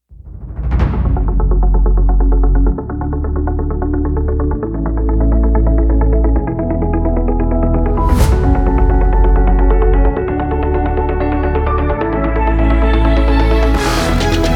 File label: Epic Electronic